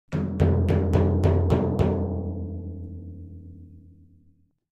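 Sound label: Timpani Hands Slow, mallets timpani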